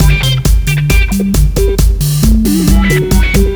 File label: FUNKY